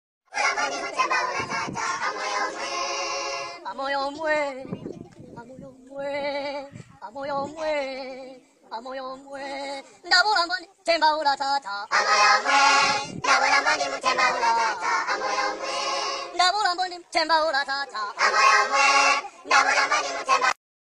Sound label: Choir